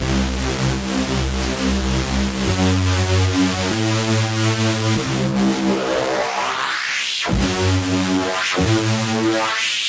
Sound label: 194 lead-dread-synth, synth and electronic loops